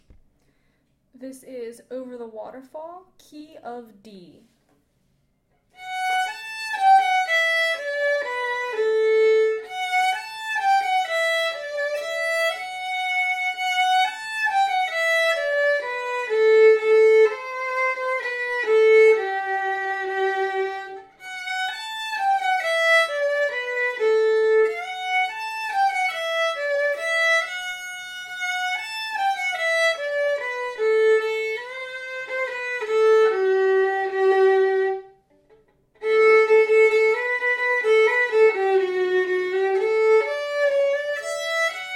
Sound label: fiddle